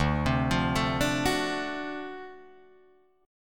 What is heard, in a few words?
D 7th Sharp 9th D down Guitar Drop D